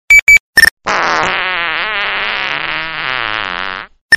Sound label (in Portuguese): Peidos; toques para celular; Nextel